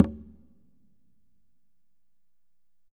Congas Wet

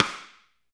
SD RI, SD Rim; Sound & Vision - Gigapack I

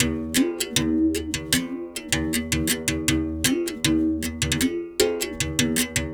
Tar, World Percussion

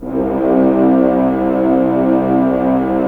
Horns; Roland - LA Composer vol. 4

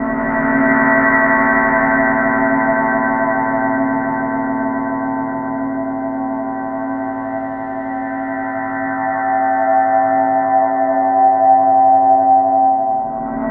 FX Feedback Sus Loop

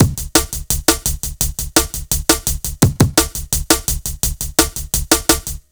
170 break, Explosive Jungle

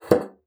BASSOON; Xsample VOL-6

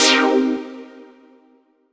Stab C3, Club Techno; Zero-G; Stabs

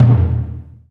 MED DRUM, JAPANESE

